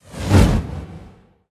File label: Meteor shower Pre impact